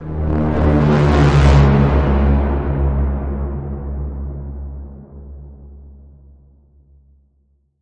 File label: Bend, D sharp